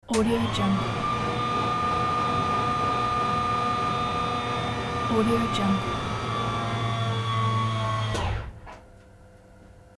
servo electric motor whirl

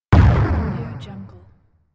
loud shot

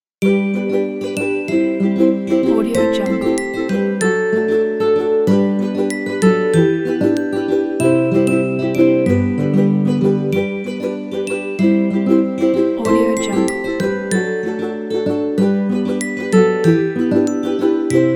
happy dreams

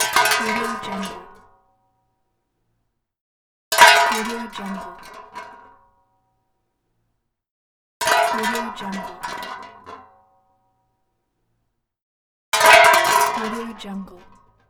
put down shovel; lokohighman

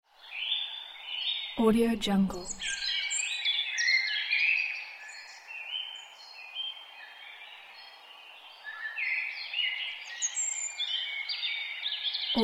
forest birdsong

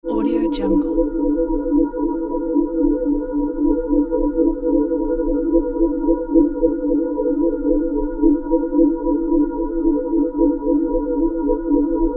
long sharp modulating horror bell

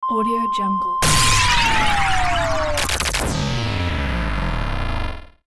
sine wave self destruct; sound ideas

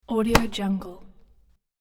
towel snap and impact

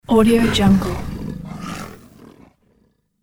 growl

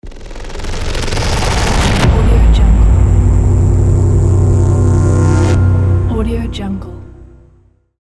hitech logo; paul-woln

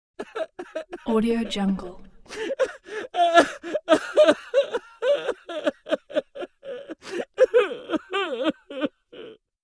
cry cartoon

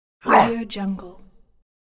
orc roar

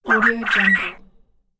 quail

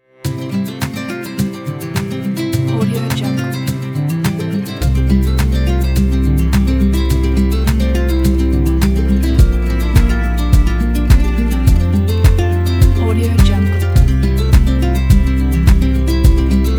this is folk

